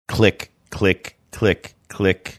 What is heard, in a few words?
click click click click